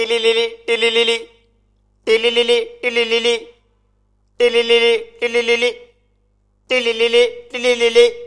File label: funny ring tone